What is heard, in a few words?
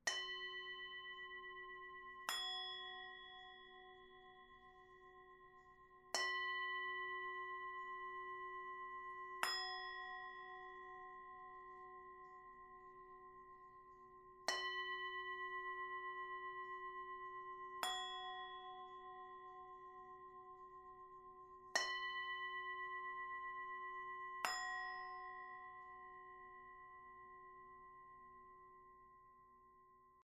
temple bowls